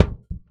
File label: Heartbeat Loop, Kicks; Airwindows